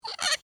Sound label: Metal Hinge Squeak, Foley, Metal, Hinge, Squeak; Small; Latches; Objects; Blast Wave